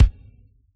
bass drum h, Yamaha DJX Rock Kit

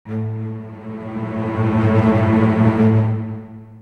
string; Techno Trance Essentials

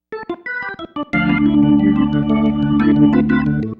Single Riff, Organ

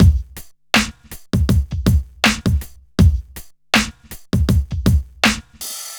Smooth, R&B Drums

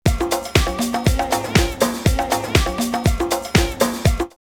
drumloops, OldSchool; X-Static Goldmine; 90 s Sample CDs

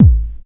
KICK deep, Techno Trance DRUMS; Techno Trance Essentials

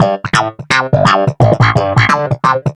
Wah Snap In A, Snap Bass; Basses

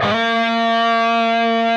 PRS lead

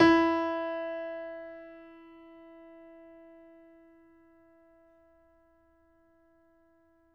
piano; East West; The Ultimate Piano Collection

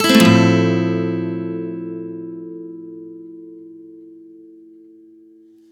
F maj7 upstroke, Acoustic Guitar; Sonic Foundry (Sony Creative Software); Songwriter Acoustic Guitar Companion; One Shot Chords

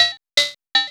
POLYMOD; AMG - Vince Clarke Lucky Bastard

